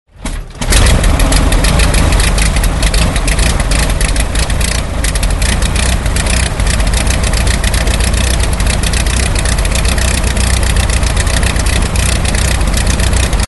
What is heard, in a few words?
spads start, Aircraft sound record